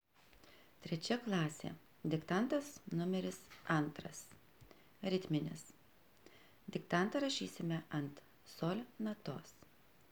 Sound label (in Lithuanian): ritminis